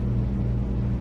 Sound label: fridge; Original TK Sounds